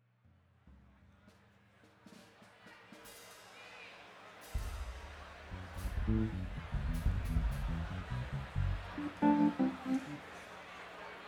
Crowd

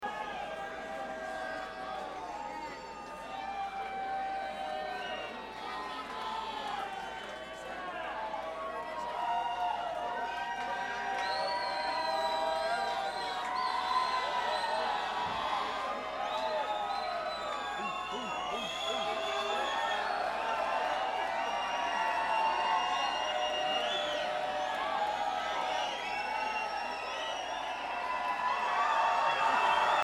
Crowd

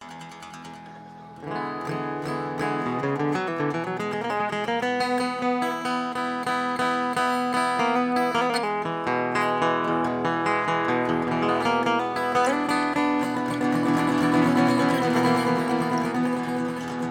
Guitar Peace

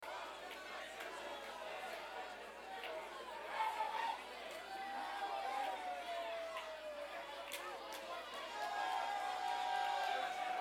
Applause

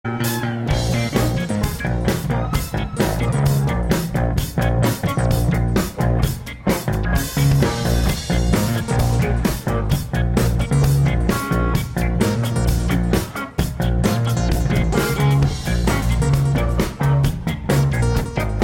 Electro Improv

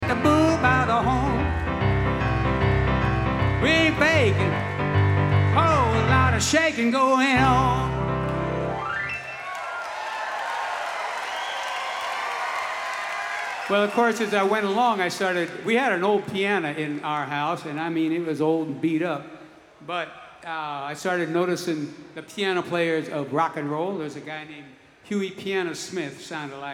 Piano Moment

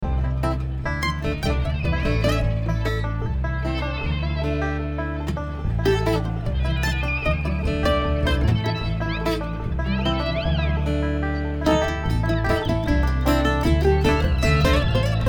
Traffic Jam

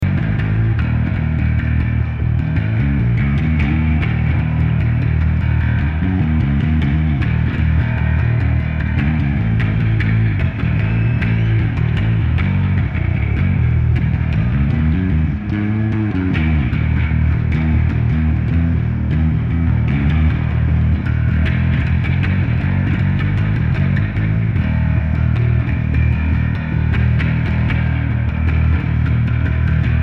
Bass Solo

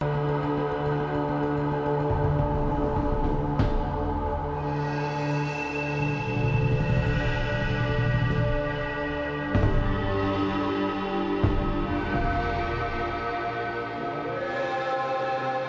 Drums

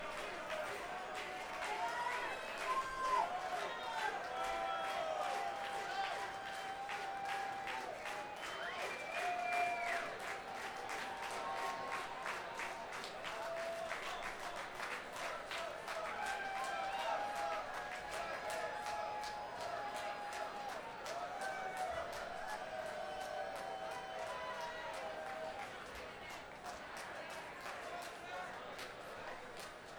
Outro Crowd

